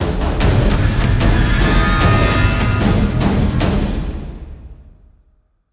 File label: Stinger Fill